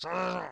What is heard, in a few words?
boss laugh; Super Trench Attack 2